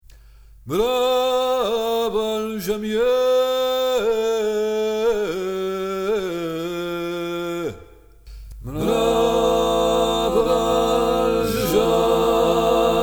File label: Tutti; Mraval